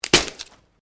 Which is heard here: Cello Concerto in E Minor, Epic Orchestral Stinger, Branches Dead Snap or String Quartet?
Branches Dead Snap